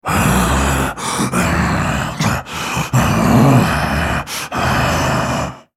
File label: spellcast effort; Volibear